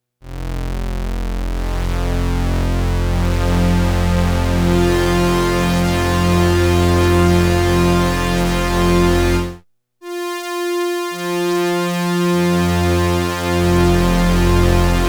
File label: OBX Preset